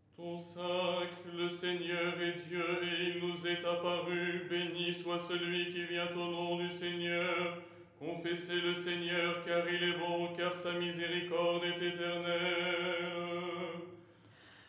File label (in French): soprano; " Le Seigneur est Dieu… "; Les 8 tons en français